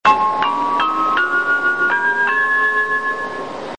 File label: bus chime; 国際興業バス